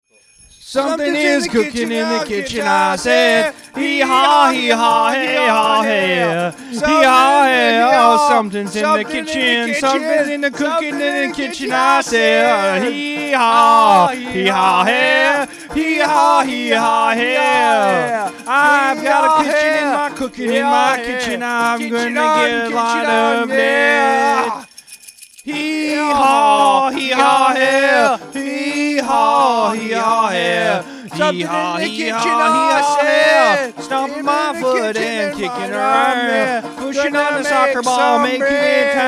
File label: skiffle hee haw